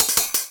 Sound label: hat; Breaks N Beats Sound Kit; Beatz Kit